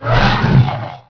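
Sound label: warrior chirp, bugs; Starship Troopers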